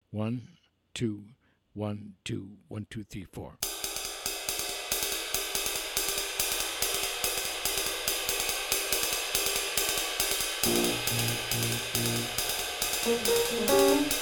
minus Bass

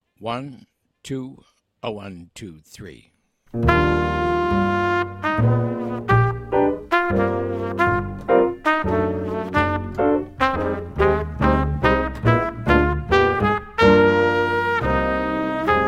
minus drums

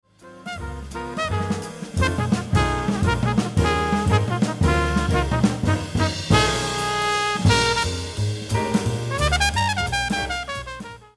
interlude

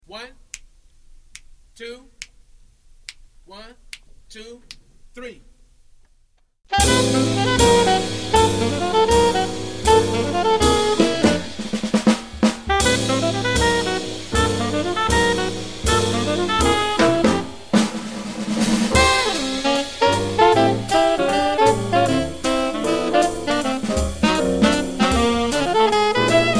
minus bass

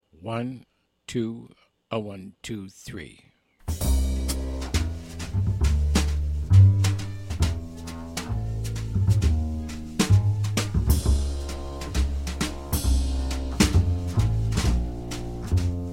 bass drums only; Pianists or other chordal instrumentalists can use this as a Minus Melody track

